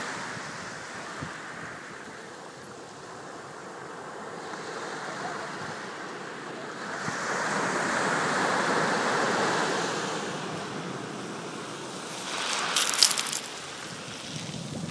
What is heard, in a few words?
high tide at thurlestone sands south hams devon uk; uksm